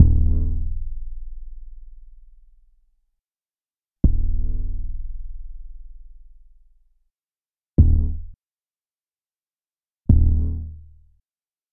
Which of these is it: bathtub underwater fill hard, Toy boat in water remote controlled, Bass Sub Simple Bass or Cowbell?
Bass Sub Simple Bass